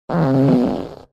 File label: fart